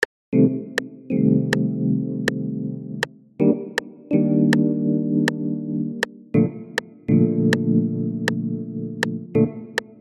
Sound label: organ not quantized